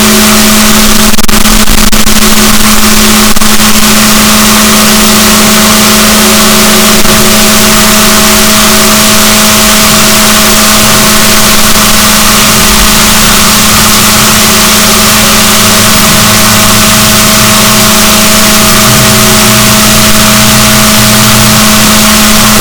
rorquals negatif, boat

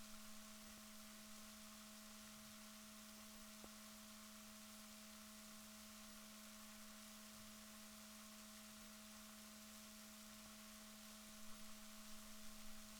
rorquals positif, Balaenoptera Physalus Chile

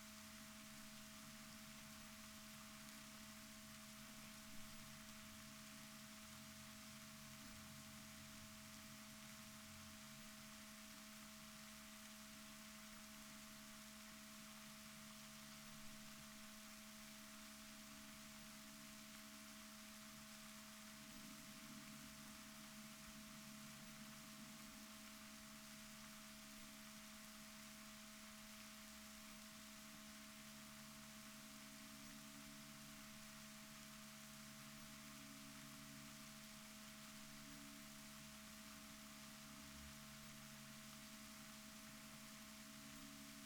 rorquals negatif, Balaenoptera Physalus Chile

